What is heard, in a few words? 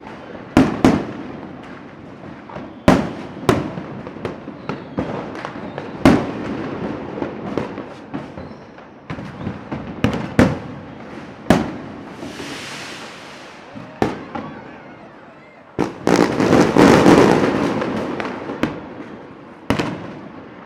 fireworks mixture urban; sound effects one